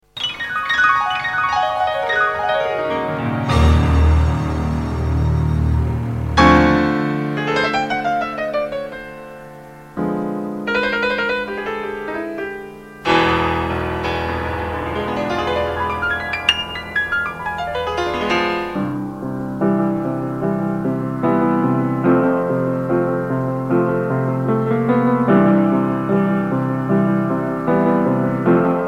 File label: piano intros from the 70s; name these 4